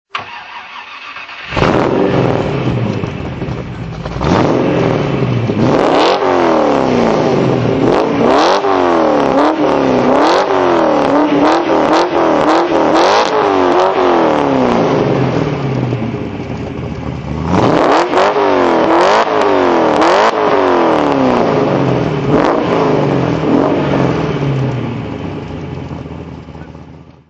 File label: morgan plus 8 4.5 throttle